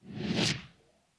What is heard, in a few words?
Se Gacha Result Slide; dankira boys be dancing